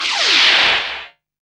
FX Dance

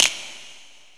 SNAP; Best Service; Dance Mega Drums; SNAPS

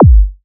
50HZ SY KICK, SYNTH KICKS; Best Service Alpha Dance vol.2

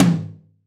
Real Tom, Roland BK-5; Toms

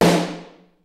kicks snare, kid; Kid Nepro; Elite; KICKS SNARES

